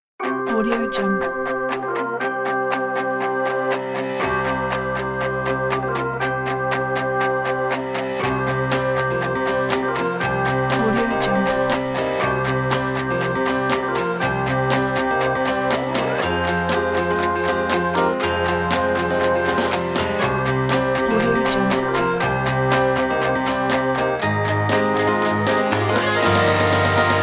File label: disco funk piano intro